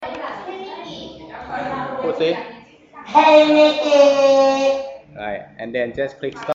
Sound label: shout